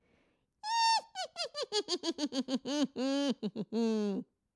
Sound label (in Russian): Смех ведьмы длинный